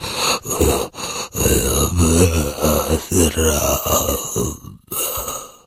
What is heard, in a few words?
zombie die; STALZONE; customnpcs; stalker